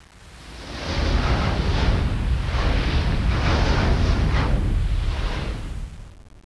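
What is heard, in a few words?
jet flyby; freeciv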